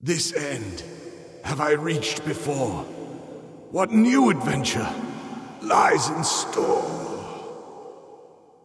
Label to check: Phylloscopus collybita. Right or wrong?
Wrong